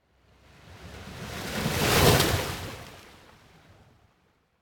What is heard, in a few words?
Ocean Strong Wave On The Coast Surf Water Lap; Hollywood Edge; sound designer's toolkit v2